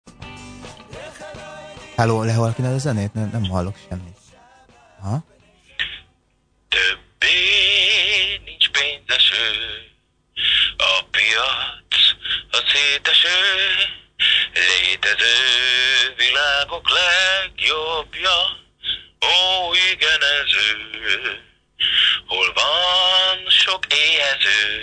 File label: Singing